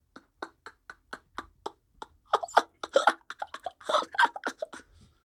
Stun Loop

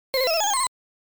Enemy Formation Hit

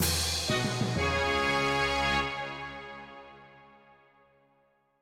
Jingle Level Up; Choju Giga Wars; 3ds ps4 switch

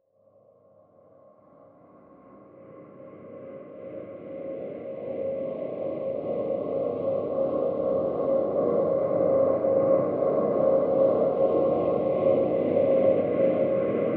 bed ambient eerie swells

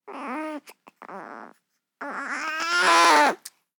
baby three weeks old cry angry

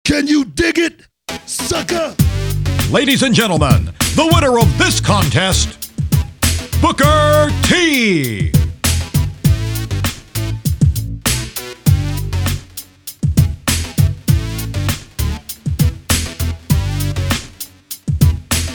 Victory; WWE Crush Hour; Booker T

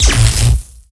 Jellyfish Zap

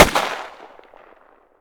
Weapon fire single SV-47

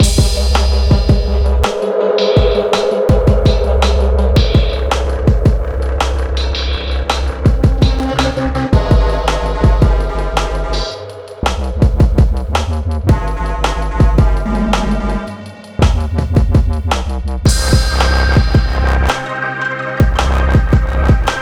Lofi Beats, World Map Music; Anger Foot

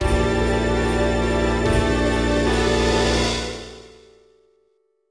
BGM STAGE NORMAL; Phantasy Star Zero

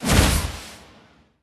SFX Power Soulimpact Impact; Kingdom Rush Vengeance

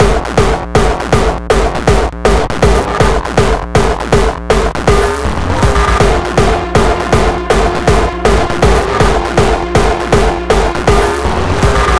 Beats Loop; Anger Foot

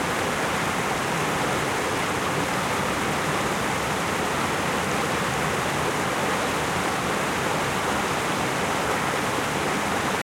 Waterfall; bejeweled 3

